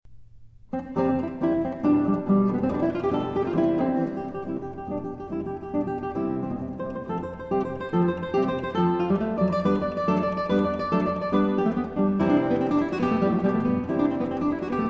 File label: Trio Sonata Allegro; Vivaldi